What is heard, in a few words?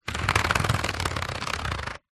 office pencils in plastic rack hand roll over